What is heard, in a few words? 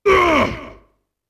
ATTACK; UFC Tapout; XBOX